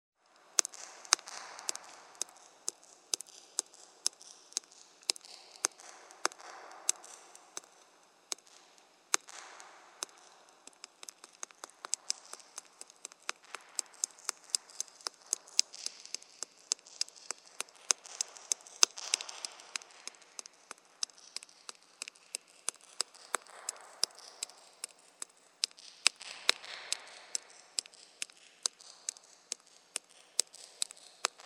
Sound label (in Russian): kapli po kamnyu v peshere s effektom eho